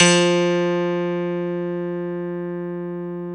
KEY MKS P3, Pop Pno + P3

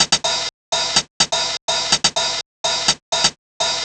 drum loop breather hat 125, Classic Chicago House; Sample Magic; Drum Loops

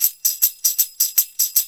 Roots Reggae; Sounds Good - Roots Reggae

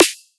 dnbish snare

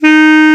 KLARINETT, Alpine Volksmusik; Best Service

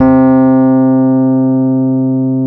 C 2, Electric Pianos; Wizoo

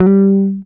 GTR TRIK, Jazzistic; Univers Sons

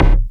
ONE POUND, DRUMS PERC; Q Up Arts; Dream Experience

